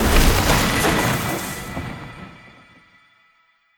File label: Spell Enchant Ligntning